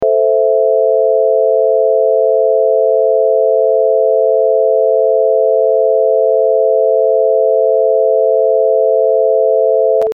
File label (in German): Moll-Dreiklang